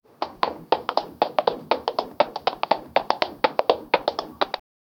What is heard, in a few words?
horse walking sound